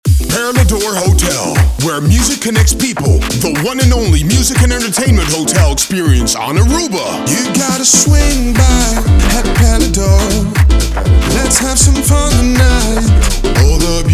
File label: Jingle; Perle Dor